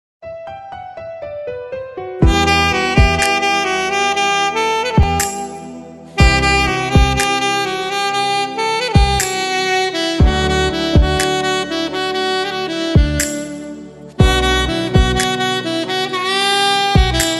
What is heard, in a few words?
Saxophone; Majid Torkashvand